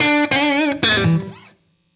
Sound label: BLUES GUITAR